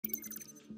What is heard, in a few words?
computer sfx